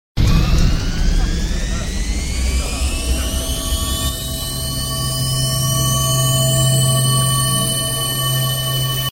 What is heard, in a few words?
Sms Tone